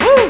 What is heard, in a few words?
pop wow